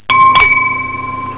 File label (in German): GLAS